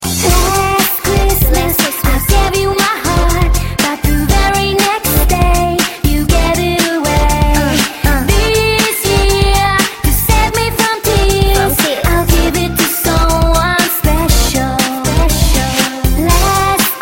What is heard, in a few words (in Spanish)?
navidad remix